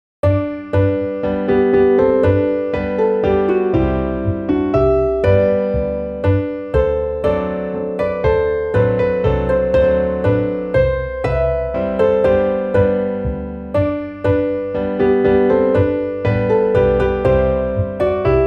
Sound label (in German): hochzeitsglocken klavier, midi